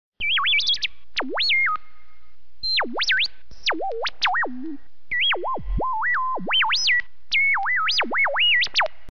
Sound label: Computer Chatter